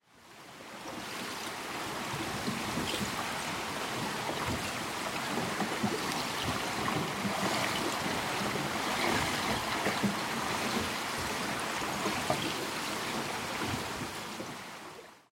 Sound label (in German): Eis bricht; Arktis; arktis vogel tierwelt und natur